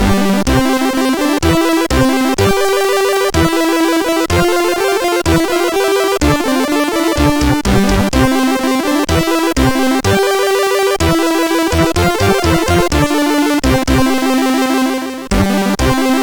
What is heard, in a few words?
crack intr, CSG8580R5; Genesis Project; hvsc